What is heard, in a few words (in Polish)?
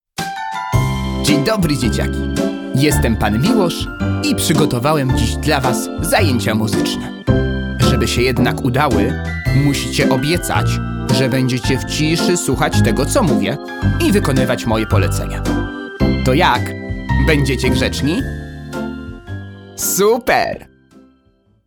piosenka Powitanie